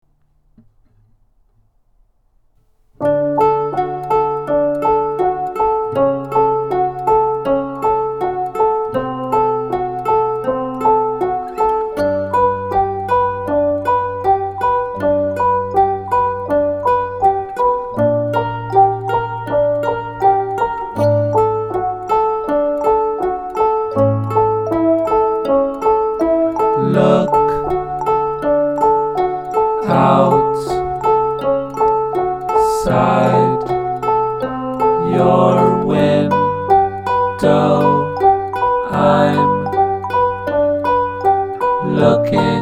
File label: creepy song